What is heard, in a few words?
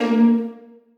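2nd violins pizzicato a#3; Sonatina Symphonic Orchestra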